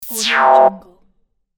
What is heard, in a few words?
cyber weapon